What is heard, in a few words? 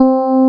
Soft EP C3; SoundFont - The Developer's Choice - Volume 1